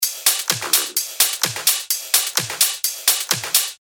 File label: 128 BPM; Licker